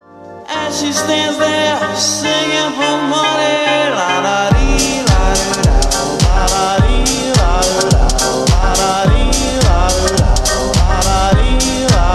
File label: slowed reverb